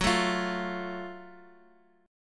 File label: F sharp Minor Major 7th, Guitar, Open D; chordshape; down